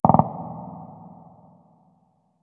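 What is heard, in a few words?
distant machinegun; Left 4 Dead 2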